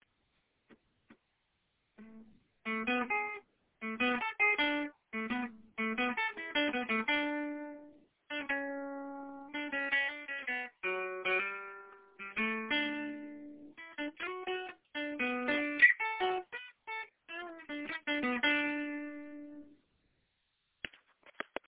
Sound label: solo